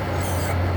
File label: Squeak; Venice-R